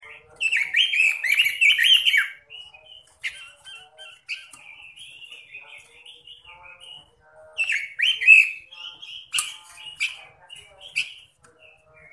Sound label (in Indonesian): suara burung robin